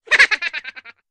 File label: Very Fast Laugh, laugh